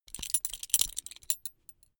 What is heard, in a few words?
metal belt buckle clatter long heavy